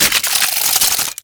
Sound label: Ink Rifle Alt Mech; Warframe; Tenno